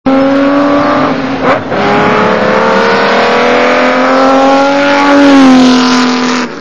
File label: ferrari 250